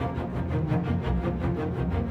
Strings; Sonic Foundry (Sony Creative Software); Orchestral VOL-2

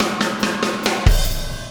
Impact Crater Beat, Processed Drumkits

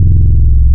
Warm OD, wavetables; Animoog

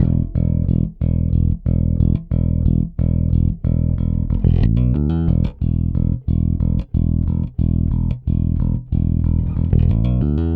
Rock, Bass; Bass X

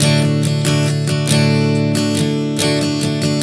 Strum 070 D, One Chord Strumming 070 bpm; Sonic Foundry (Sony Creative Software); Songwriter Acoustic Guitar Companion

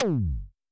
High Tom, Yamaha PTX8

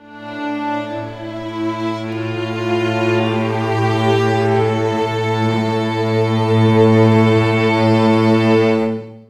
Chamber D min, Strings; Zero-G; Total Drum Bass

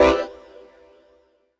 CHORDS; EastWest; Dance Mega Hip Hop Electro

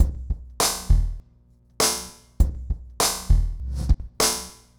Remixed Drums 100BPM; The Roots Drumloops

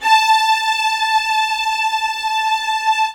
LD2 SUS A5, LOUD VIOLINS; Q Up Arts; Denny Jaeger Master Violin Library

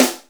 Snare, Akai MPC-500; Toolkits; Snare TKit

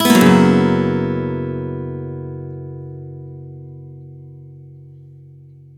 One Shot F# 7 11 upstroke, Acoustic Guitar; Sonic Foundry (Sony Creative Software); Songwriter Acoustic Guitar Companion

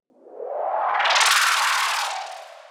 Whoosh, sci-fi

